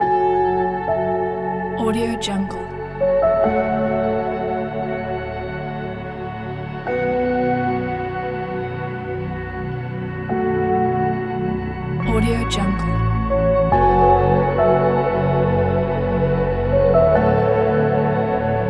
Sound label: ambient background chillstep; cleanmindsounds